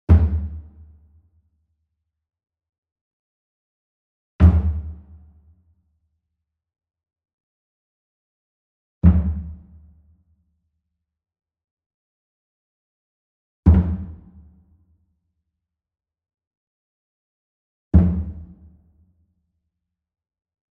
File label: Small Drum Fontonfrom Flam Soft Beater Medium; boom library; Cinematic Strikes Construction Kit